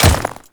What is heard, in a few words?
Ice Step, Footsteps